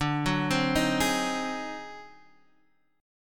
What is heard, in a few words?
D 7th Flat 9th Sharp 11th; arpeggiated; JGuitar